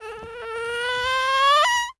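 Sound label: TALK, baby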